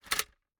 Gumball machine, metal, glass, candy, switch, mechanism, rotate, jammed, wide; Eiravaein Works; Vaeyan IV